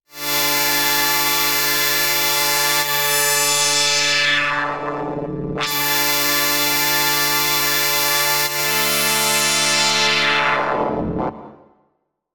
Organ Drone; GForce ImpOSCar3

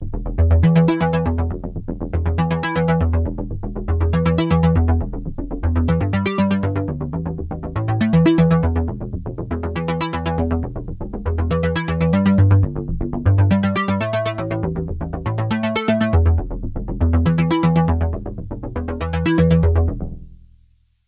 JP Arp

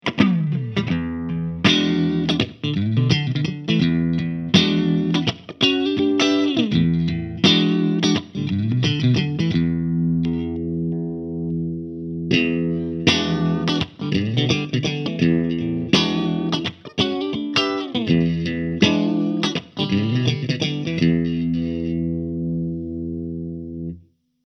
Clean; RAW DAWG 60 EG

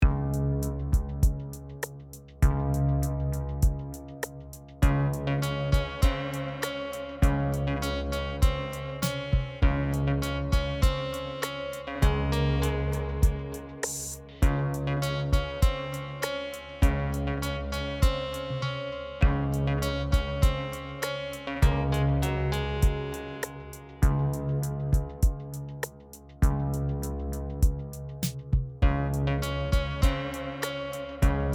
CS50

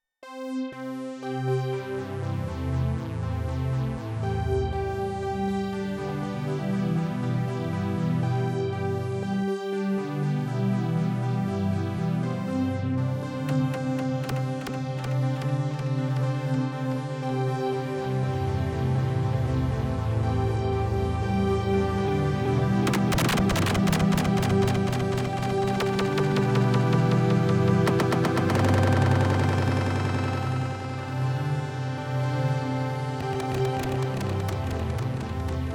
Synth PingPong; NTS-3

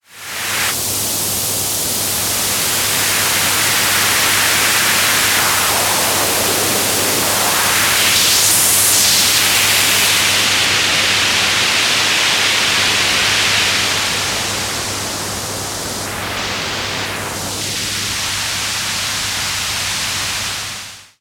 Retro Filter; Plug and Mix

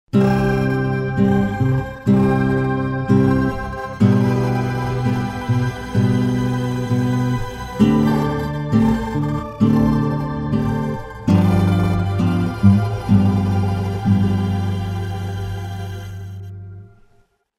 Rising Crystallizer; EFX Refract

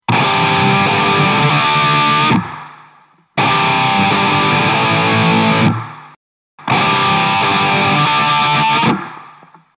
Fortin Zuul Blackout Distortion Loose

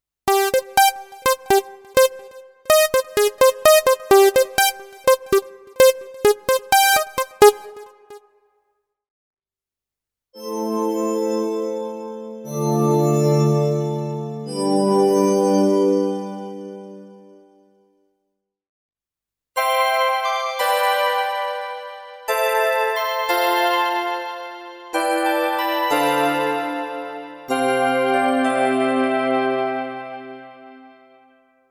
Synthesizer; Roland Go Keys

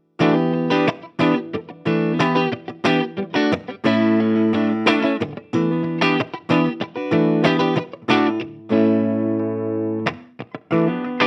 ESP E II Clean

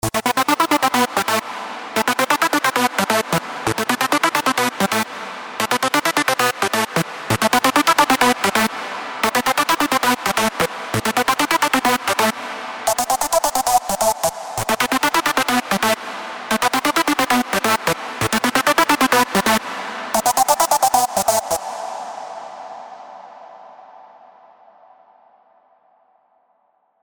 LD Baller Blaster; Vengeance Sound Avenger 2; Factory 2

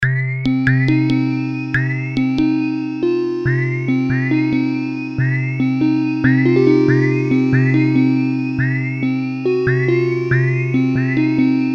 Mini Brute V Keys

